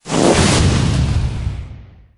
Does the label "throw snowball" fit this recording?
Yes